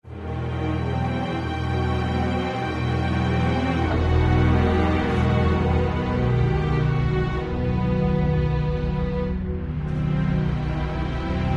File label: Linear Spooky; tales from the borderlands gamerip 2015